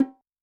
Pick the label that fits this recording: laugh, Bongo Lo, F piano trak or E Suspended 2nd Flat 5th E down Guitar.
Bongo Lo